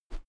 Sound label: whoosh feather slow; swooshes-swishes